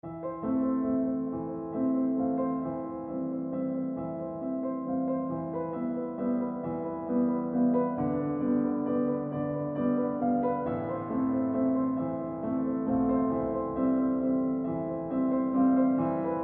ORCHESTRAL

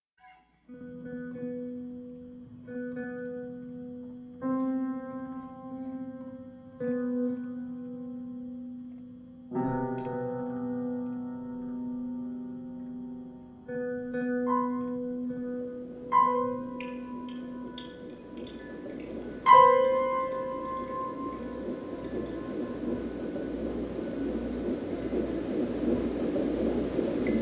Skittish

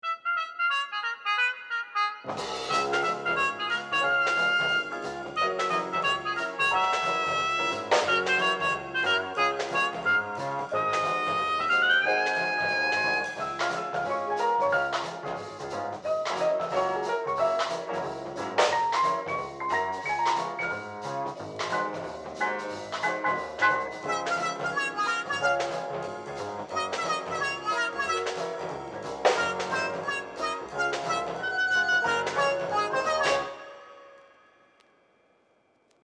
Up Tempo Jazz

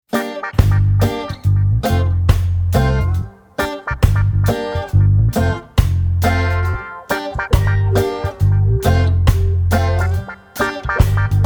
DUB

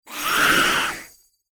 Grab Instant Kill VOX; Gut Eater

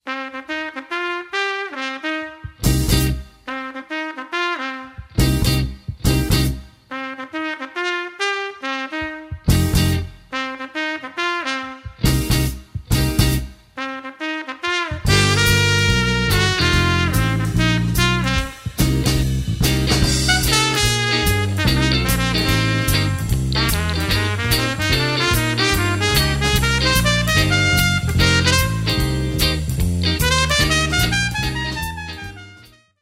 Instrumental; Coda Club

